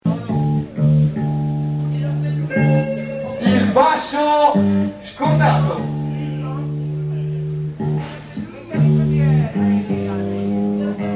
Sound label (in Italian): bassista